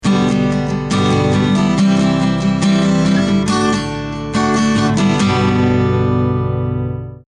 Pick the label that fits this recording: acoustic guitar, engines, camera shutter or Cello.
acoustic guitar